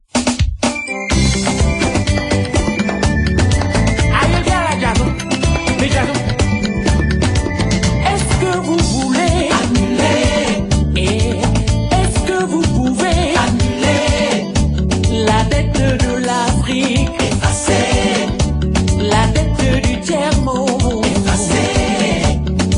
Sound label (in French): Chanson